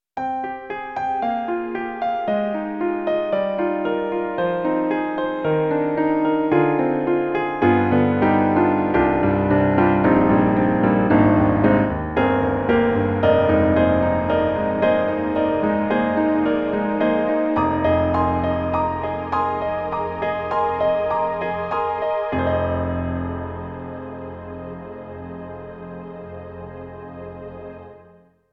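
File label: Roland SonicCell Piano +Strings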